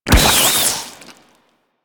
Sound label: Infested Face Hugger Death; Warframe